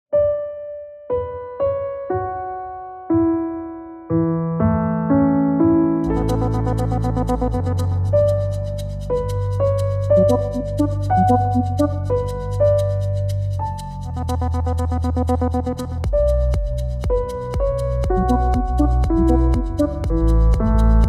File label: piano ballad; synthvibes